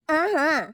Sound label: Woman Dance Happy; Zomboid; Lifestyle